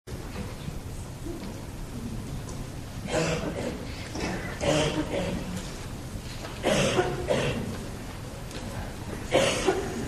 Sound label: silence